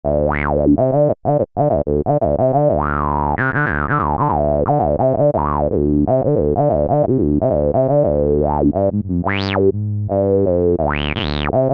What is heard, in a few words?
TB303 Reso, Roland SRX-07 Ultimate Keys